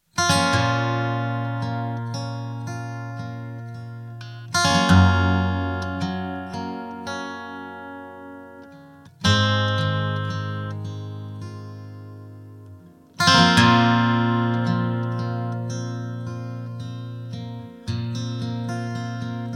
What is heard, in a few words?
gibson l50